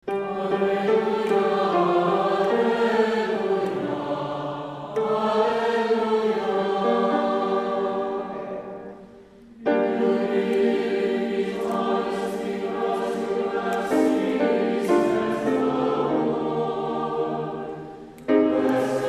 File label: Gospel Acclamation Easter